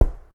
nutfall

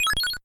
checkpoint; mega runner

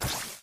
shoot; steam camp; stinkfly shoot

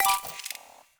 particles; space tunnel

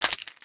arrow break; orc invasion

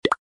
set click; bffs dark academia winter outfits

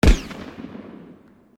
gunshot and echo long; deep worm

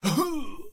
oho; brawlguys

